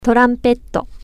trumpet

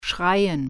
scream

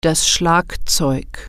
drums

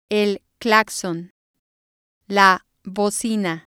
horn

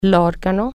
organ

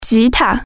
guitar

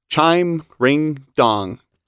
Chime Ring Dong; NewYear

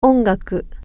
music

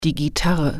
guitar

